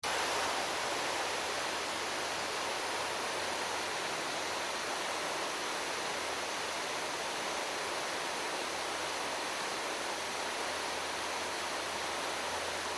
Water Spray Garden Hose Cone; Garden Hose Gun; Cone Setting; Hissy; BigRoomSound

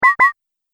Multimedia Double Button; Button Sound Effects; Computing Noises; Andrew Fleming

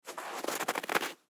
Snow Footstep Single; Snow Footstep Single Light; Weather; Snow Sound Effects; Part of Snow (Sound Effects:Weather); Blastwave

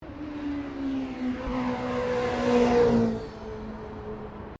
Traffic Motorbike Pass By; General traffic sounds; Backgrounds; Traffic and Cars

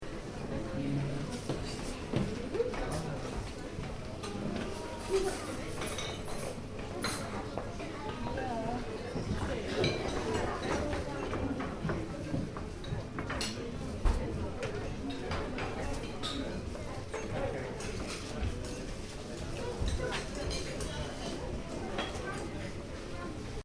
Interior cafe; Café, Interior: Ambience; Cafeterias; Backgrounds; Stuart Duffield

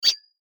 Beep Whoosh Metallic Squeak; Production Element Imaging Element Accent Transition; Multimedia; Beeps

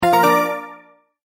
multimedia tone start

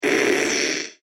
Alien Droid Fart, robot; Robot Sound Effects; science fiction sound effect; Andrew Fleming

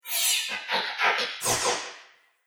Robot Factory Noises; Robot Sound Effects

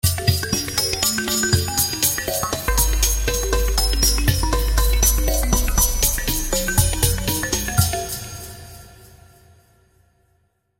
Tribal Percussion Melodic Twinkle; Hits; Blastwave

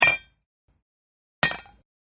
Glass cheer, Cheers Glasses Collide; Bars; Toasts (Sound Effects:Bars)

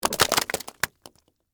Splat Debris Juicy, Long; Texture; Splats (Sound Effects:Objects); BlastWave

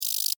Beetle, Rattle, Vocalization, Insect, Bug; Sound Effects:Animals:Insects

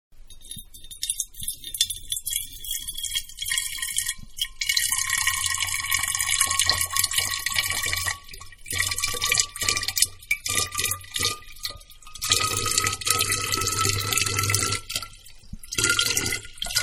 Wine Glass Carafe, Wine-Pour Into Glass Carafe; Pouring; Manhattan Production Music

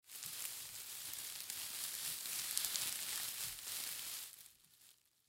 Christmas Tinsel Handle Pick Up; Holiday Sound Effects; Foley; Blastwave

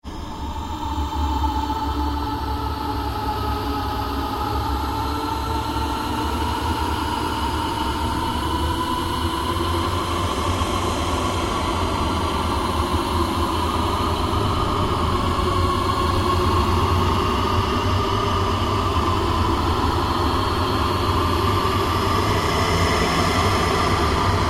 Ambient Drone No Sweep; Sound effects created by manipulating other sounds; Drones; Sound Design

